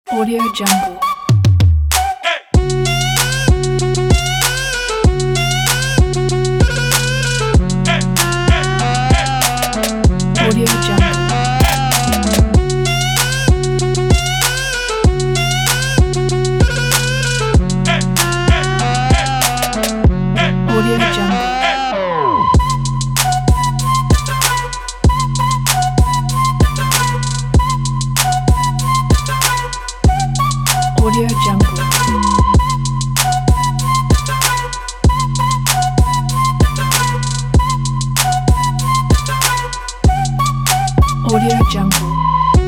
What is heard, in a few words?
modern hiphop trap